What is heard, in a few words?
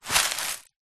foley footstep single on massive amount of loose vhs tape; zapsplat